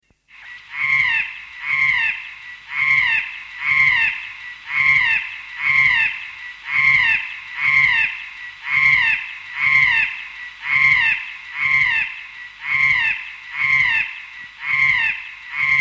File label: kokako song; conservation, native animals, birds